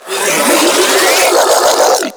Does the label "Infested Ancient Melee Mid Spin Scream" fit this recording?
Yes